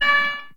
computer use

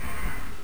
scrape; madcars2; radicalplay

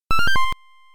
note fx; seagaia; ld22

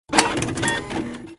conveyor

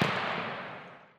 ball in glove; 2fast2curious

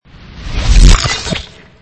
warp

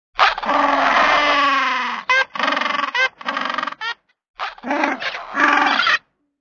penguins; animals; Duplo Zoo

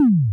Normal Bounce; zj the ball

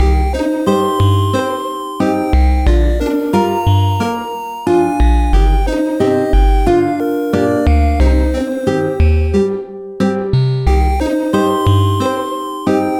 Ranch BGM

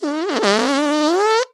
fart

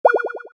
bonus

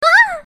block; kanwu

